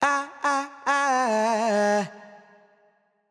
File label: Euphoria Ad Lib Wet - 140 BPM F# Min, Vocal Sample Pack; Cymatics; Ad Libs